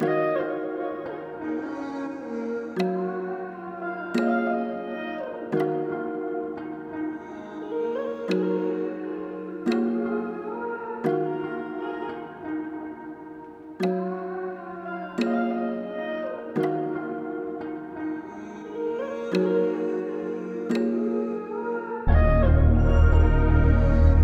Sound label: 174 BPM A# Min, RnB, Melody; Cymatics; 2022 Melody Collection; RnB Pt 2